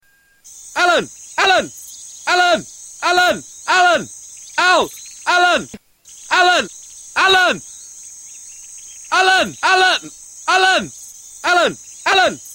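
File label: Is Calling R&B, ringtones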